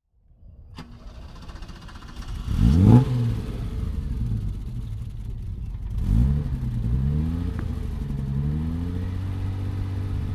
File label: Alfa Romeo 6, Alfa Romeo V6; Busso's Alfa Romeo V6; six-cylinder engines